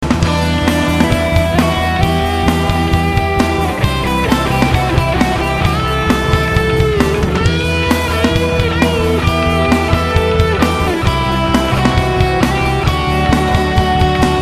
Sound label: Solo